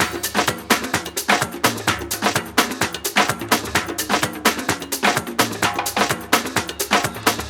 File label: Latin House Loop 128 BPM; Vengeance Samples